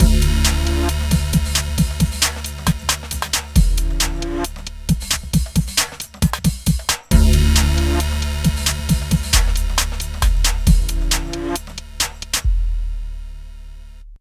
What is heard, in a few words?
Hardcore Breakbeat, 135 Dmin; Big Fish Audio; Hardcore Breakbeat Action